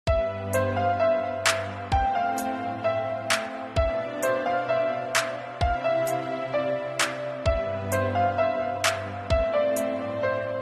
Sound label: Mobile Ringtone